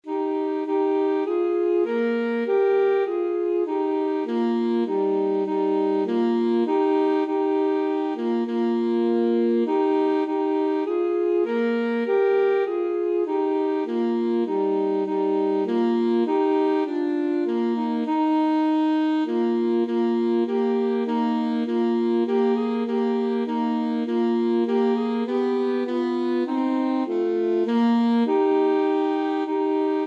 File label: alto saxophone duet